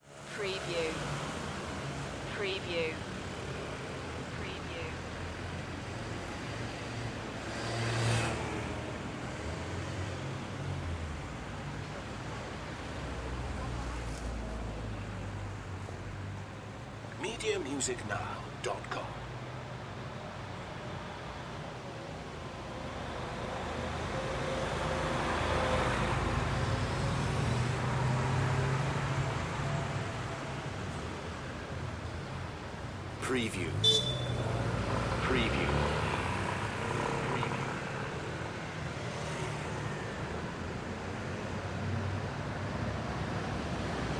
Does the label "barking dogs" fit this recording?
No